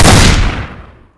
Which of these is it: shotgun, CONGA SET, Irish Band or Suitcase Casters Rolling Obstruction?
shotgun